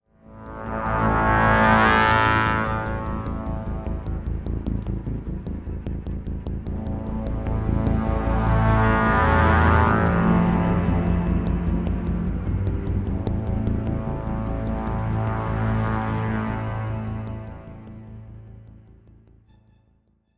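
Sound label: sting, horns strings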